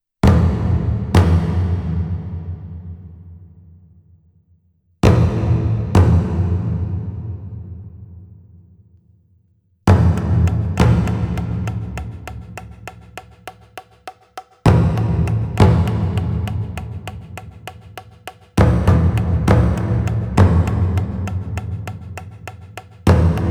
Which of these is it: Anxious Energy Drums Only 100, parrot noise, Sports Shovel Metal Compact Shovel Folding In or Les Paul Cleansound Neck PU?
Anxious Energy Drums Only 100